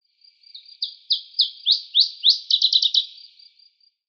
Iberienzilpzalp Phylloscopus brehmii; Vogelstimmen

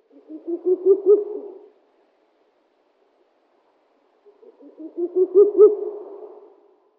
Habichtskauz Strix uralensis; Vogelstimmen

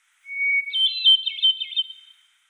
Einsiedlerdrossel Catharus guttatus; Vogelstimmen

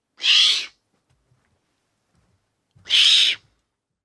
Sibirischer Uhu Bubo bubo sibiricus, Vogelstimmen

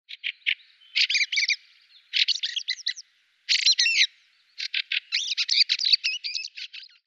Wacholderdrossel Turdus pilaris; Vogelstimmen

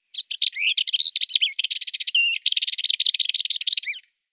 Maskengrasmuecke Sylvia rueppelli

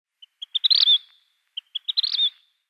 Purpurtyrann Pyrocephalus rubinus

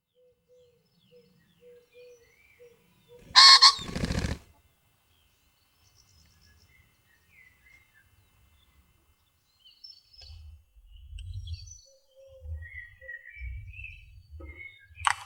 Fasan Phasianus colchicus, Weinviertel; Vogelstimmen

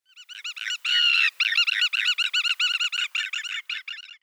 Spornkiebitz Vanellus spinosus; Vogelstimmen

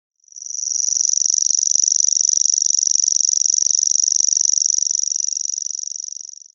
Feldschwirl Locustella naevia; Vogelstimmen

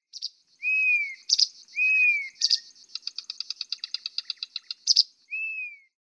Buschrohrsaenger Acrocephalus dumetorum; Vogelstimmen

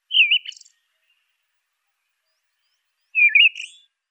Schieferdrossel Zoothera sibirica; Vogelstimmen

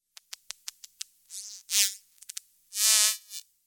Schweinswal Phocoena phocoena; Tierstimmen

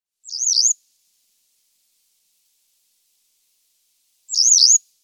Schafstelze Motacilla flava; Vogelstimmen

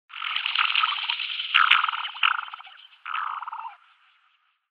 Sandflughuhn Pterocles orientalis; Vogelstimmen

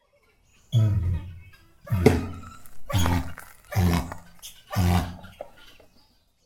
Helmkasuar Casuarius casuarius; Vogelstimmen

